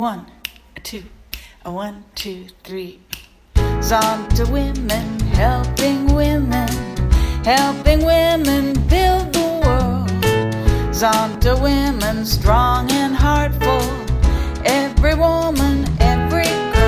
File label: Jingle background; Zonta